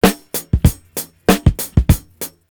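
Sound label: drums, Pure Electro; USB Soundscan vol.03; AKAI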